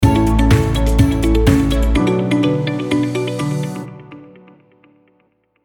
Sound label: comedy fun sting funny summer happy travel vlog music